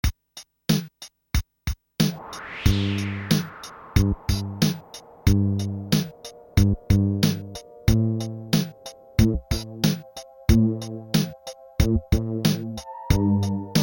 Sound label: drums pattern